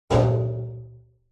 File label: impacts metal medium hit clang small reverb; zapsplat